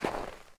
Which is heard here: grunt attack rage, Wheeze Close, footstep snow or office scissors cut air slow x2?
footstep snow